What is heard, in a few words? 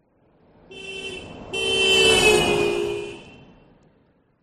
vehicles car hyundai trajet pass fast road beep horn doppler; 2006